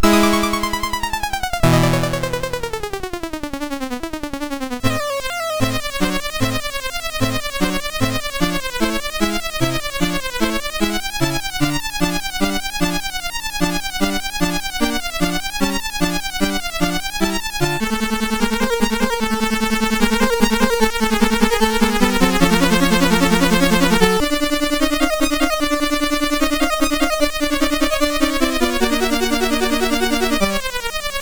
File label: Flight of the Bumblebee, MOS6581R2